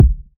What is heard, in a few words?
subby urban bass drum one shot b key, steel kick drum